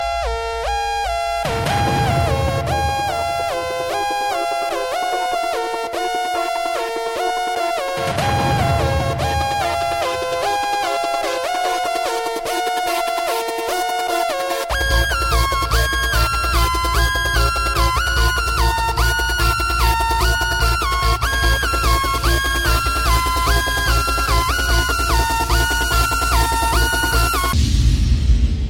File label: Titanic Techno